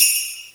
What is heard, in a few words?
sleigh bell; osu